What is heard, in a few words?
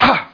die; CD Action; outlaws